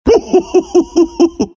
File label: laugh; tusk